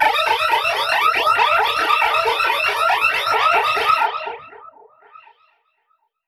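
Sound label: Modulation Proc, alarm siren samples, 120bpm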